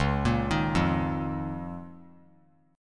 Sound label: Db 6th Add 9th Db down; Db6add9 arpeggiated; JGuitar